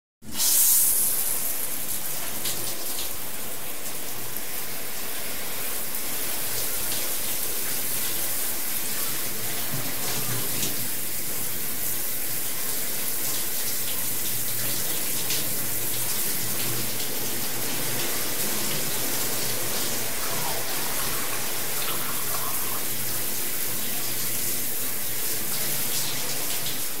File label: shower water noise